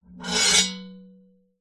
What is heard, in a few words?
sword slow scrape on metal resonates; zapsplat